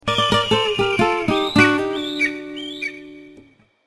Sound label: BANDA BEAT